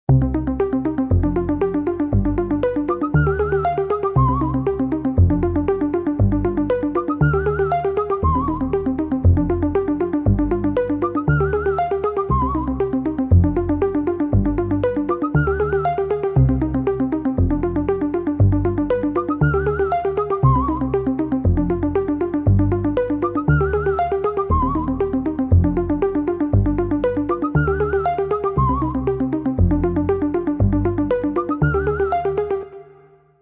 little bird, zvuki ios 26

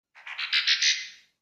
zte notification transform

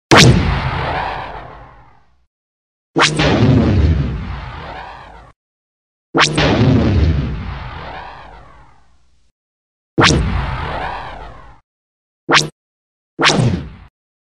blaster; x wing

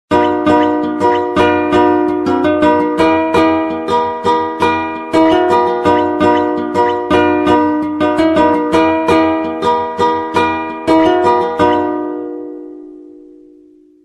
garden waltz

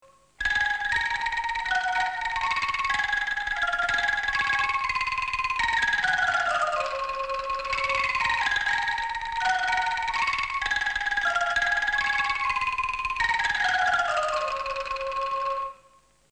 angklung

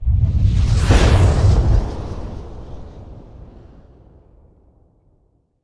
power wooshes

